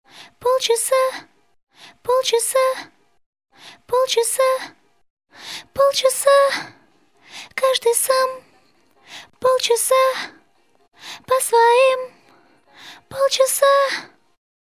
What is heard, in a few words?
Backs